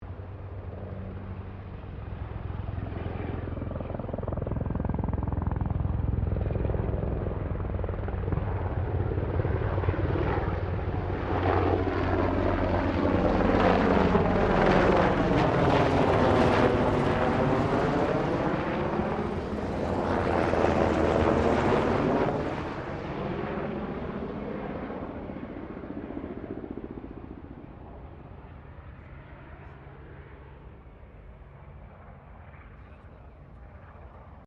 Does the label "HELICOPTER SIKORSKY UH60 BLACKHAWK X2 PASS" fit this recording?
Yes